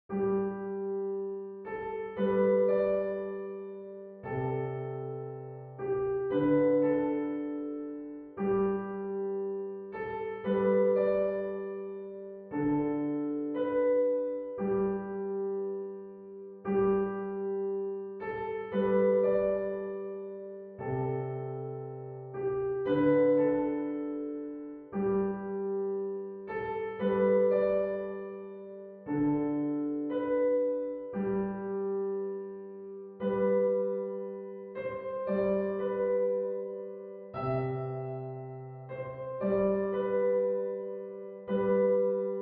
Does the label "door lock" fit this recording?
No